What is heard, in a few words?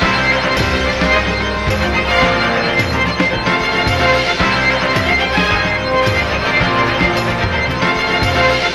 strings; Phonky